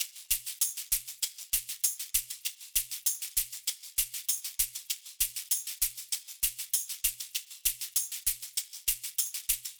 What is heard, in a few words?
98bpm Shakers; Exotica